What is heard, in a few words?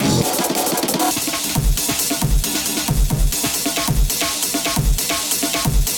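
drum beat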